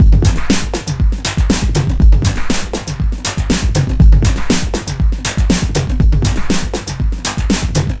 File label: 120bpm, Downbeat Dance Grooves